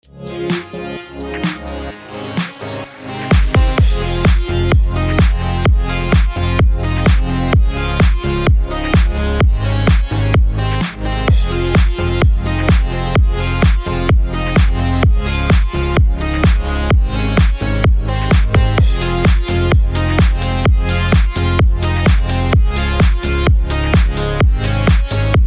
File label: BED ELECTRO FMIN 128BPM